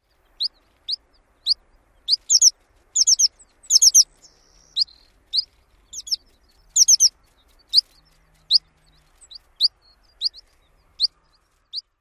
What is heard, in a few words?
calls